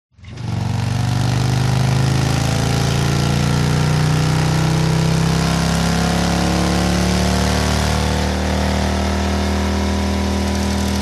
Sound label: TRANSPORTATION MOTORCYCLE Harley Accelerate Heavy